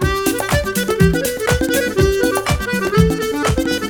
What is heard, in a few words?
jackpot win; Clover Rollover 2